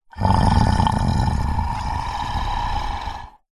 horror monster slow breathy groan growl

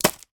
food chocolate in wrapper drop onto more in tin; sound effects one

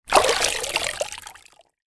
household mop remove from bucket of water drips

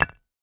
toast put on plate

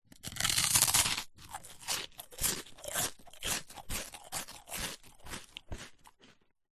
human eat bite crunch munch toast; zapsplat

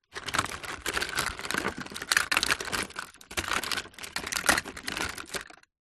household makeup items rummage

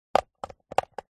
leisure rc car remote plastic joystick movement; zapsplat

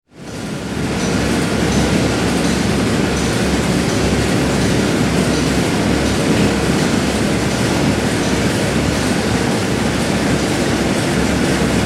ambience factory interior hum; sound effects one

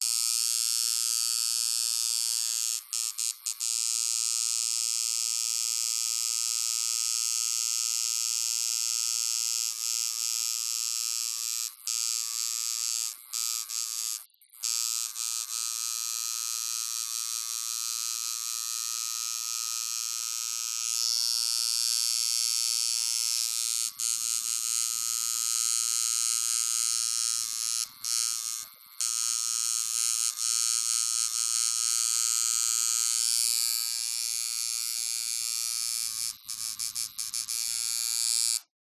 foley tattoo gun operating tattooing

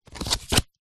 leisure playing card turn over on top of deck

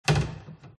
close garbage; fox audio

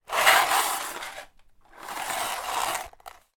sport table tennis retractable net pull release

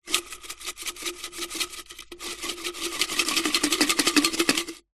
foley paper notes many in glass jar shake lid off

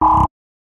ui computer complex deny

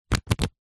game cards short shuffle; uno

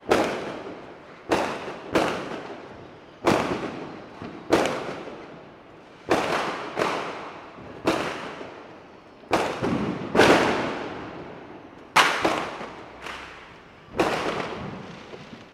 fireworks street urban; sound effects one

